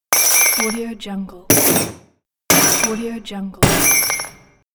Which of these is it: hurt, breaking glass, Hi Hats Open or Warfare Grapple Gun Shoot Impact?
breaking glass